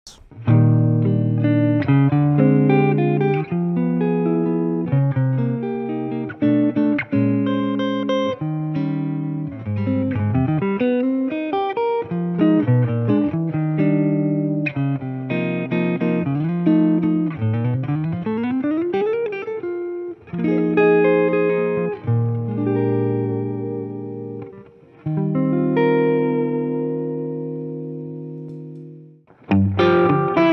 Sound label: Gretsch 6120DSW